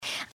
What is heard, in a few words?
breath; pony; Twilight Sparkle